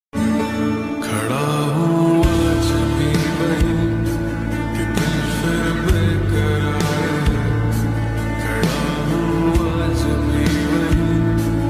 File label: slowed reverb sadsong; aesthetic lyrics